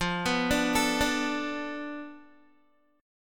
F 6th Flat 5th F Guitar; arpeggiated; chord; JGuitar

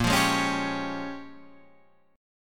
A sharp Minor 13th A sharp, Guitar chord

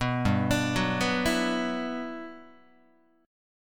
G Major 13th, Guitar; arpeggiated; JGuitar

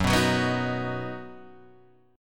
Eb Minor Sharp 5th, Gb

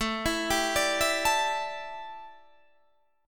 D Suspended 2nd Suspended 4th A

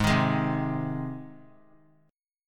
G sharp Major G sharp, chord; G# strummed; 20,22,23,22; JGuitar

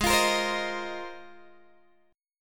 Ab 6th Add 9th Ab, Guitar, Open G

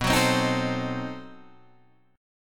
B 7th Flat 9th B Guitar Open D

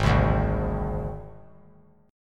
G sharp Major Flat 5th; strummed; 8,7,5,6; 3C,3F,3A,3D

